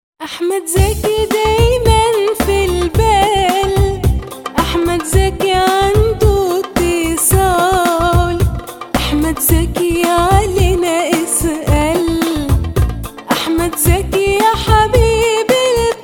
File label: Tone